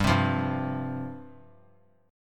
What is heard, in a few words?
G 7th Suspended 4th G down; G7sus4 strummed